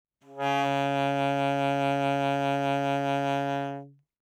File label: Alto Sax vib ff Db3, Eb Alto Saxophone; Woodwinds; MIS Pitches - 2014